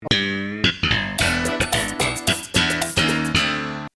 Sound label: sitcom transition bass riff; seinfeld